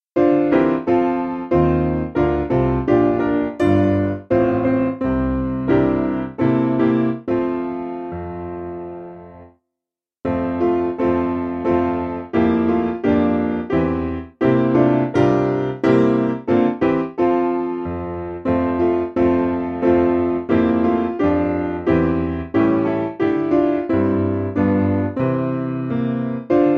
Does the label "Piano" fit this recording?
Yes